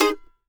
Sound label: MANDO G# MAJ R; Sampleheads; Whole Lotta Country 1 & 2